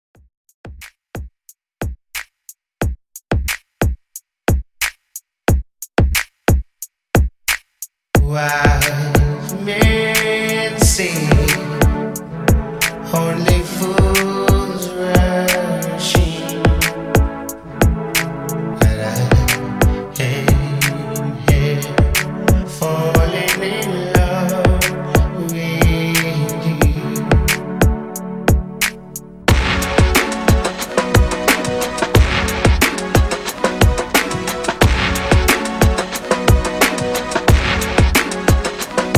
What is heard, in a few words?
90s Classic ReDrum, 90 Bpm